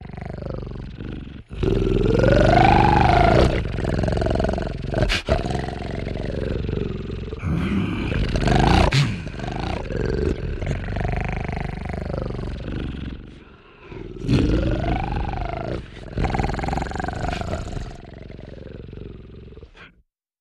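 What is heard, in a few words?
Devil Dogs Snarling, monsters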